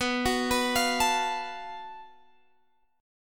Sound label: Gb Minor Major 7th Double Flat 5th B